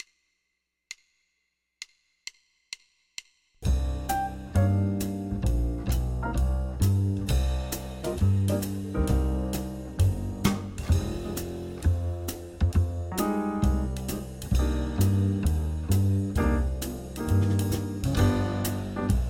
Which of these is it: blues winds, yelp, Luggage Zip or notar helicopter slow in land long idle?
blues winds